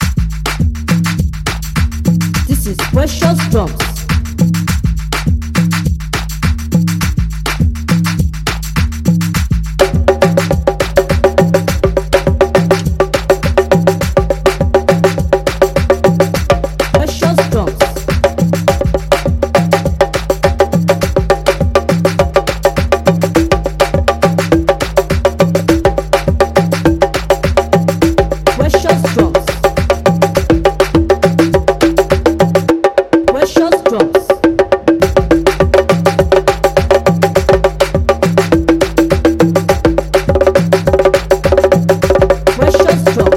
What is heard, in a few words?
Conga Instrumental